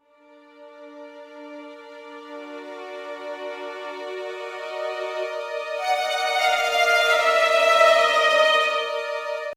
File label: music Nightmare Valse accompaniment